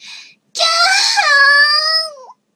きゃあーん！

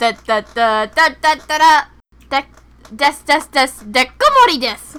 てってってー（アカペラ）でっでっDEATH！凸守デス！

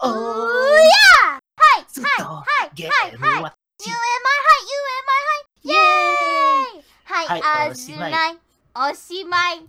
（アカペラ）

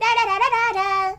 ラララララーラ♪; 音MAD; Cookie

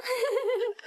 笑い

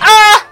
悲鳴; 音MAD; Cookie

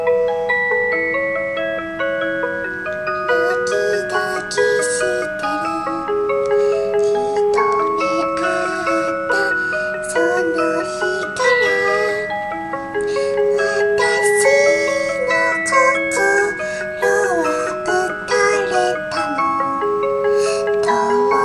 しゅわスパオルゴール

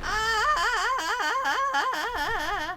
ああああああああ

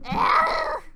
えあ”う”う～！

